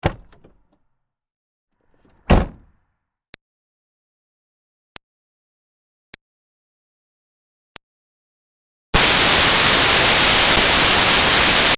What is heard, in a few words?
Car Smart Car Door O-C Hard; 2005 Smartcar Fortwo; Vehicles; Big Room Sound